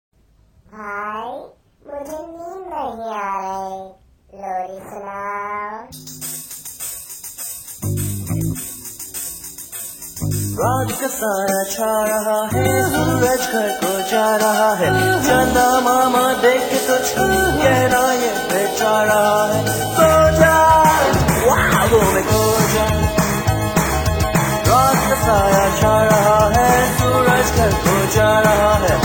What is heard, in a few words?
Lori